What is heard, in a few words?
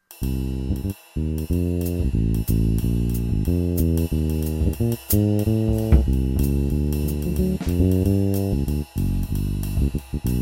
Backing, jazz